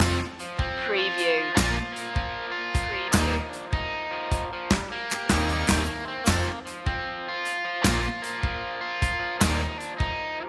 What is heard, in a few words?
springing indie loop